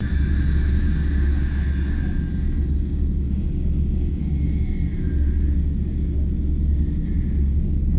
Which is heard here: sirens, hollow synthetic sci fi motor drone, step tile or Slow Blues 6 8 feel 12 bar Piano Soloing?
hollow synthetic sci fi motor drone